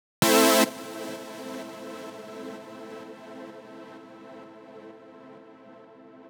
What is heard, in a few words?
Control Synth 2 Root A, 128BPM; Vengeance Samples; Control Kit